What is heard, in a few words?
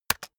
keyboard external space hit fast